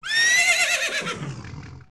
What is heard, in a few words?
Horse Neigh; Ganondorf's horse neighing